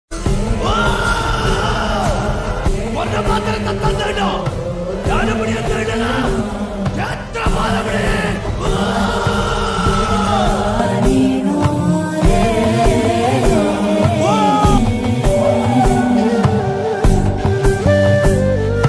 kantara movie bgm; hombale films

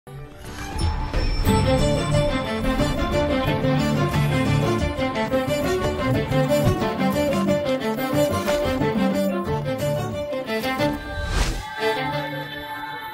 intro bgm ringtone; seetha; sita ramam

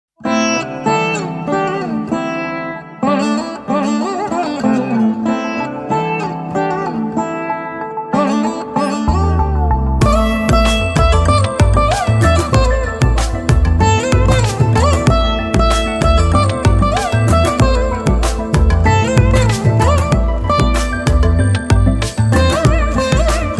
bgm ringtone; hridayam; pranav; kalyani

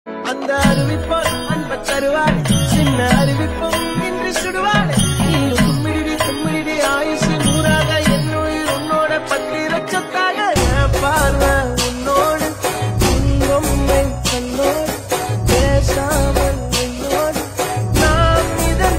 x kerala band x dhandiya remix bgm

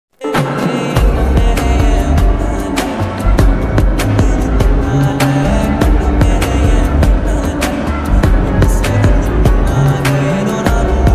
song ringtone bgm ringtone

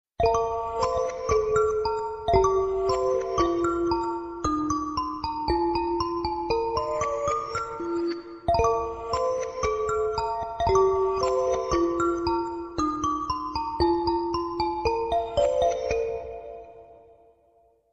ringtone slowed reverb

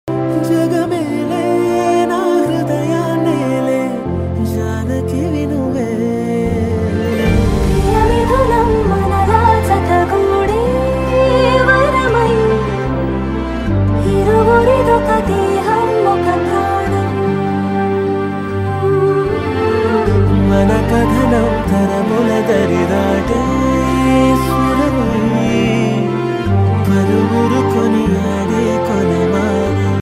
south bgm ringtone, tamil bgm ringtone; priya midhunam; adipurush

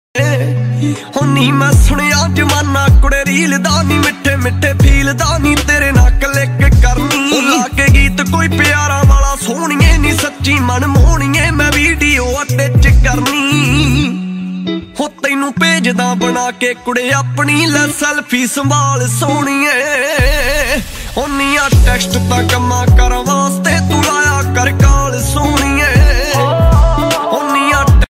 punjabi new songs bhangra

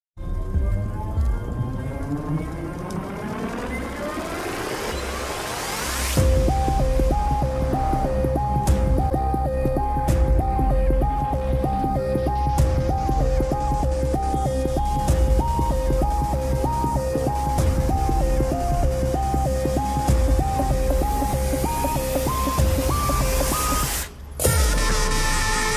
villian bgm; wild dog movie; revealing of villian

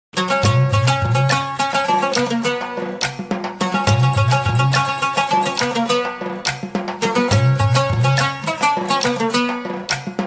song ringtone psycho bgm; vaaranam aayiram; tamil ringtones; malayalam ringtone

